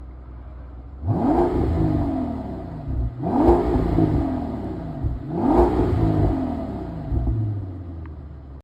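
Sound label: Maybach, exhaust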